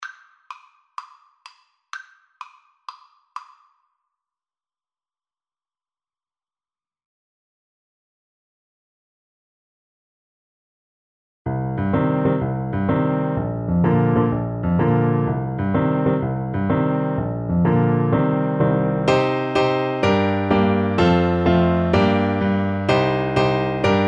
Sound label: road to lisdoonvarna, Trumpet